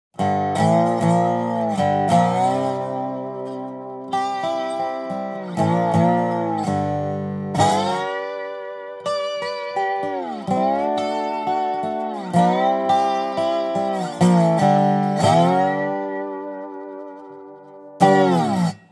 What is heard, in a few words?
dobro